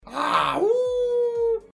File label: haaaaaa uuuuuuuuh